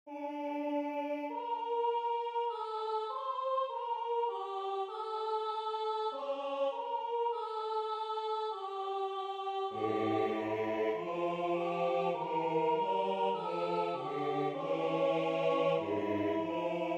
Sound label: Small Fuga